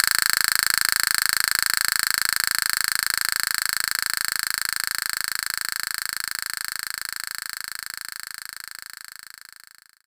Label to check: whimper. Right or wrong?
Wrong